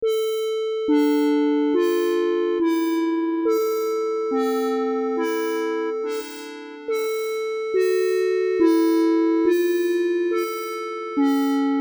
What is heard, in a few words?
Pedal Tone A in D Minor